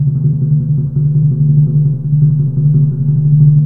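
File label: timpani roll; Roland - LA Composer vol. 1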